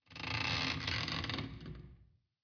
wood sfx; cstrike; mansion

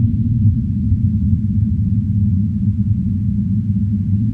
field, ambience; de tabocas

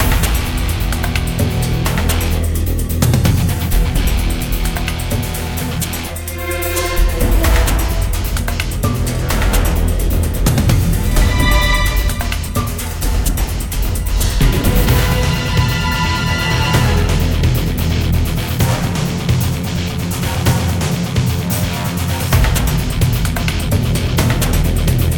countdown

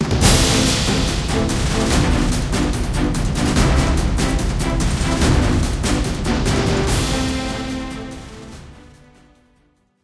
prison theme; music

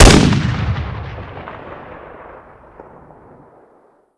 scout fire; csof

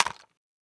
swing, weapons

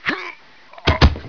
death, player

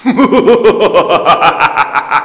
rofl; valve

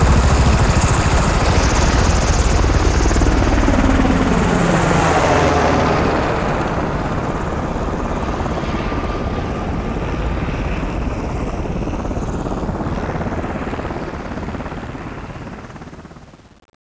blackhawk fly off

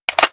SG552 clip out, weapons

